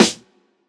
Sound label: snappy steel snare drum f sharp key